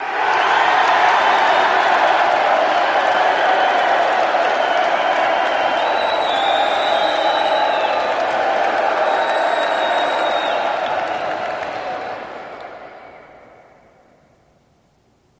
Crowd Approves, Crowd Cheering Biggest Approval Interior Gym